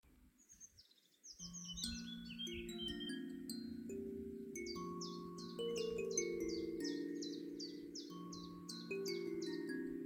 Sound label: Fmajor kalimbas; 21 ton; Walnuss; Ronja speziell; F-Dur